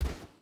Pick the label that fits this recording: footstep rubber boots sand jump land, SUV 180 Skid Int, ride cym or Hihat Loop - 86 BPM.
footstep rubber boots sand jump land